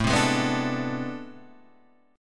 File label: A Minor Major 11th, down, Guitar, Standard; strummed; AmM11; JGuitar